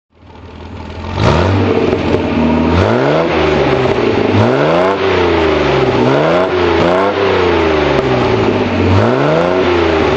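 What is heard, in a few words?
austin healey 3000 mk1 sidepipe throttle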